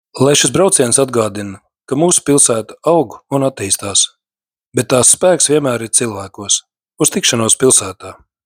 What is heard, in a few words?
Retro outro; muzeju nakts